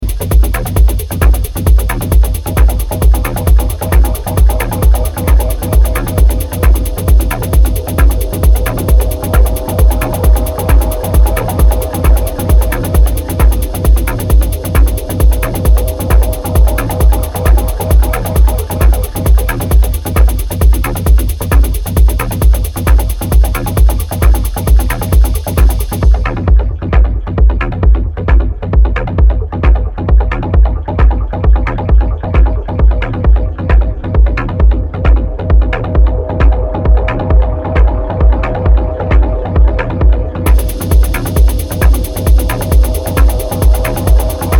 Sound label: Cyclig soundscape